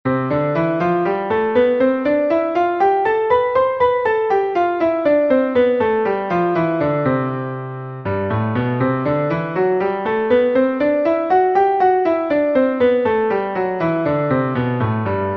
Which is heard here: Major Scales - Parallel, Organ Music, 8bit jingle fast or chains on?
Major Scales - Parallel